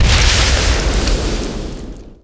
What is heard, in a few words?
acid rocket hit; weapons